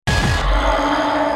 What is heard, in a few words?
twin hit complex short